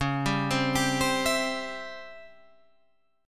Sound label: Db Minor Major 7th Flat 5th, Guitar, Drop D